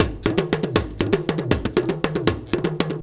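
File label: big tama groove; Best Service; World Colours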